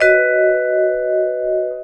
VIBES; Houseworx

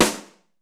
ROOM S, ROOM KITS; Crypton - DrumBase5000

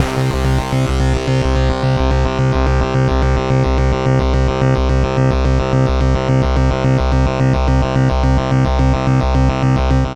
FUZZ SEQ C; SYNTH; AMG; Global Trance Mission VOL-2

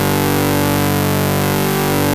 ARP 2600 D2, synthesizer; Hollywood Edge - Giorgio Moroder Rare Synthesizer Collection

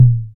FX Analog; Sound & Vision - Gigapack II

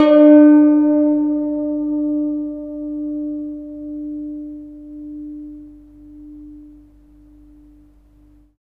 SITUR D4; Spectrasonics; Heart of Asia

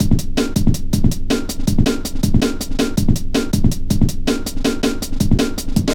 metallic stepz, drum loops

